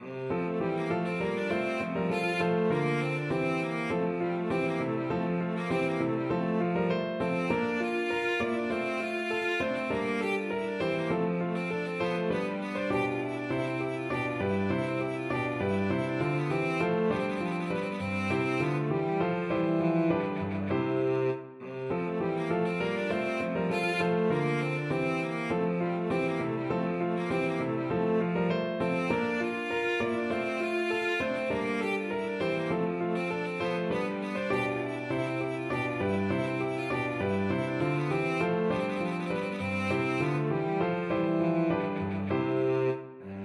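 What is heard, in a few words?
violoncello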